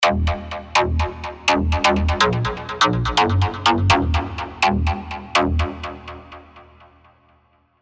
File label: Dark Techno Gong; spire old skool